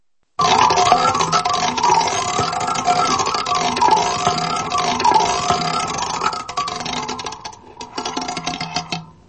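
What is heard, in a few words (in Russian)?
шарик катится по колесу рулетки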